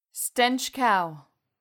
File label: stench cow; bestiary; 5etools